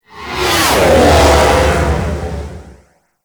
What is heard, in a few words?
Ship Close Short Fly By, Engines; Warframe; Vehicles; RailJack; RailJack Enemy Ships; Grineer; Engines Generic